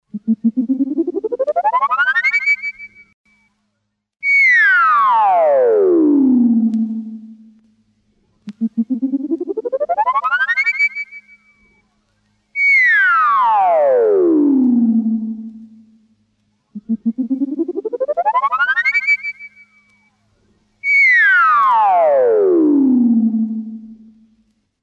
ascend + descend seq iv, Electronic Sound Patterns; His Master's Voice